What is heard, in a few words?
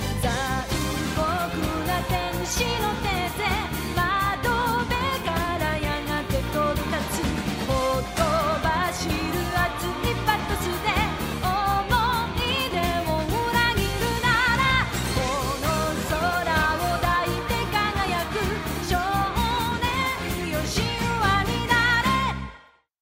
song; lord draugr fans